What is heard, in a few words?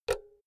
power cord heavy insert remove unsteady; objekte; elektronik